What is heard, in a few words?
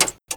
relay, Cockpit; A32X